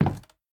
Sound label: Wood soft